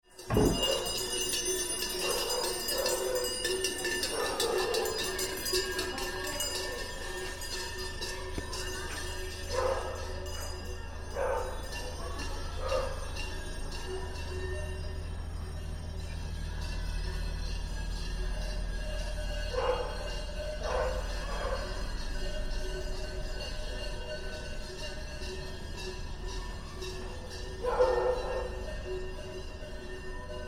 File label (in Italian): Suoni Ambientali; Alta Vallagarina; Calliano; santa lucia 2013; Portobeseno